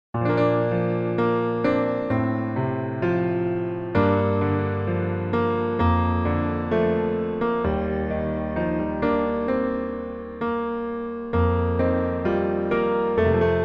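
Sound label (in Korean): Piano version -1키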